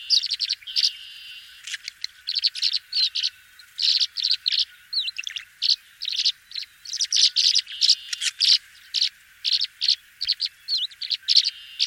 tree swallow

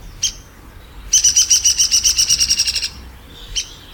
downy woodpecker song

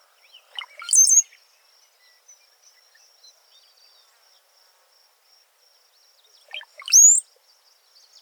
brown-headed cowbird

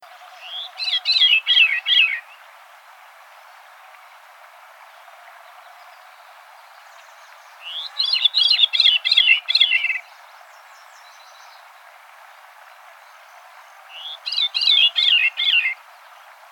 veery

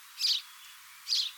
house sparrow

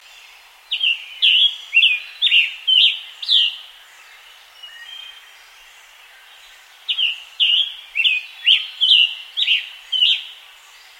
scarlet tanager

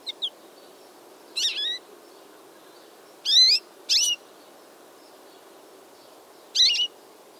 american goldfinch

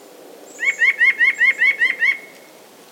white breasted nuthatch

